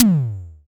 high floor tom sample G sharp key

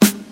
studio snare drum g sharp key

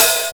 modern raw open hi hat sound e key

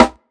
thick steel snare drum sample a sharp key

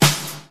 Snare D Key, Loudest frequency: 3478Hz; snare drum samples; drum single hits one shots

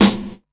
crisp snare single hit g sharp key; snare drum samples

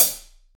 small reverb foot cymbal f sharp key; hat samples

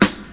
fluffy snare one shot g key

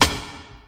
classic reverb snare single hit a sharp key

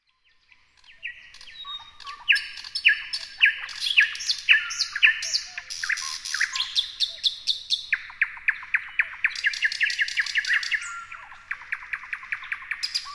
thrush nightingales singing

